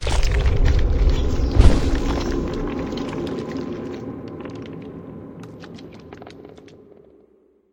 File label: Exit, Pocket Dimension; Room